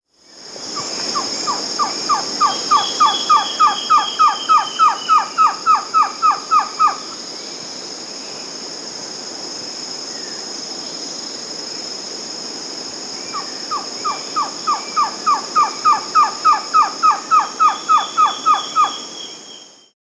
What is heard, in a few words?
White-tailed Trogon (Trogon viridis); S. America